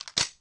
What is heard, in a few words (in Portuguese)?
gun recarregar